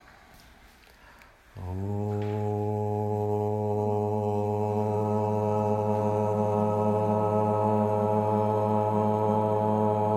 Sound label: opening asthanga mantra